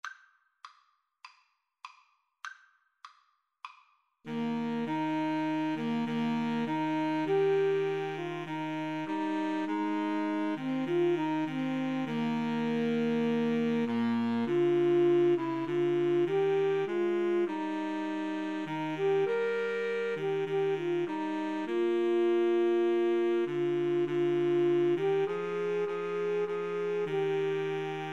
SAX, Woodwind Trio

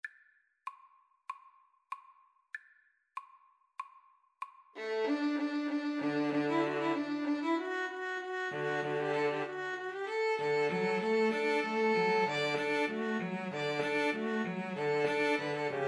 rejouissance, String trio

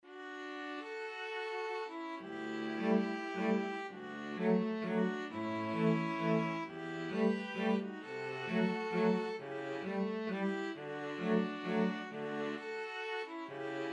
string trio

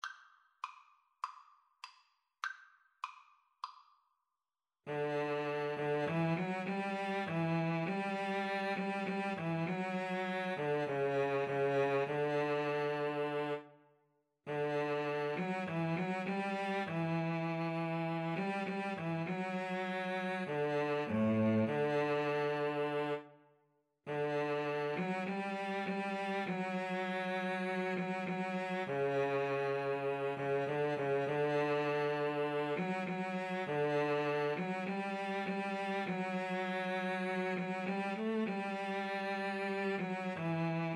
cello cello; how great thou art

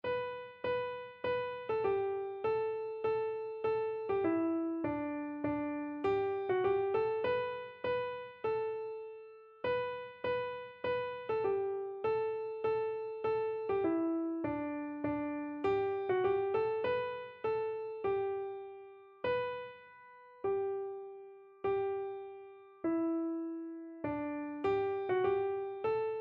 what a fellowship, keyboard

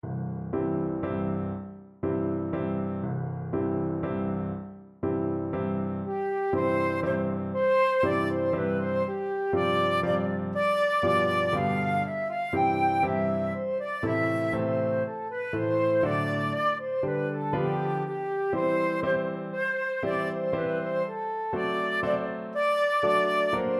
saint saens elephant full, flute

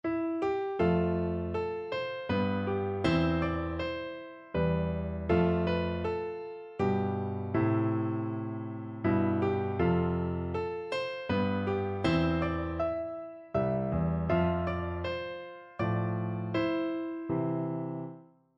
piano duet; holst jupiter

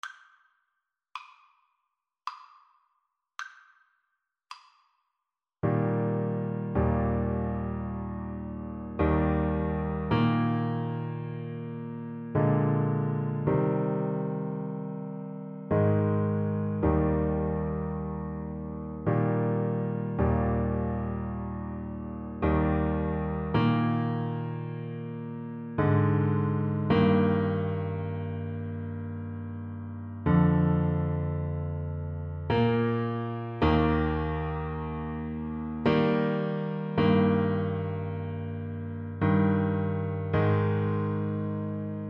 amazing grace, Baritone Saxophone

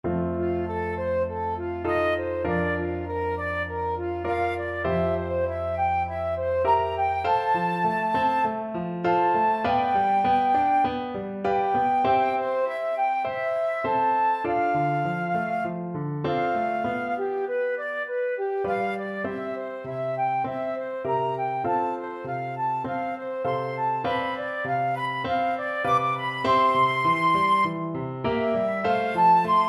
flute

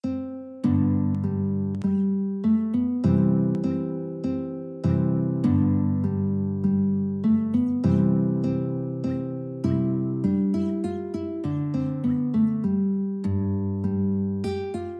English Dance guitar

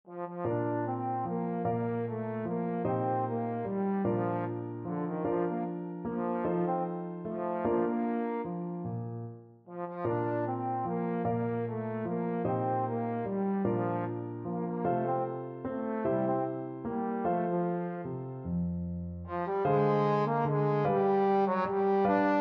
trombone

